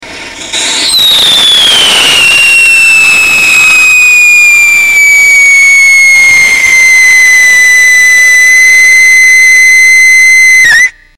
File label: bismuth trioxsalicylate, rockets whistle tests